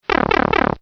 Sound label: power down